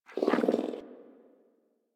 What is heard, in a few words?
Puppy Interact Affection Closed Mouth; Enemies; Kubrow; Warframe